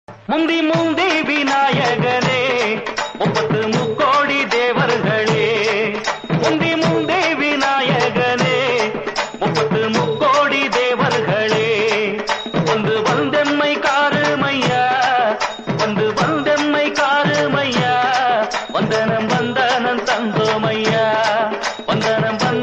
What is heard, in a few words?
Tamil Ringtone; Karakattakaran